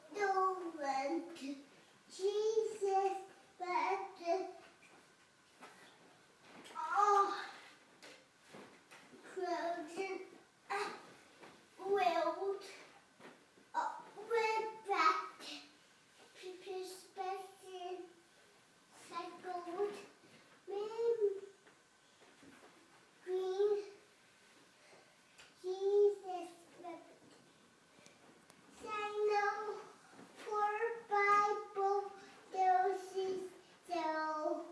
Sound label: Singing, singing himself to sleep